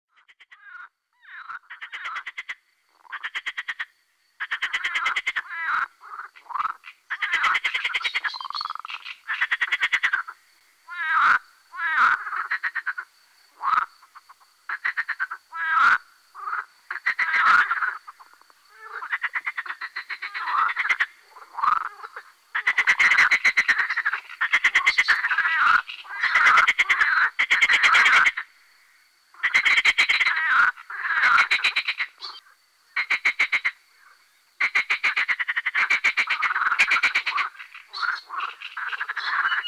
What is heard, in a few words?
Pelophylax ridibundus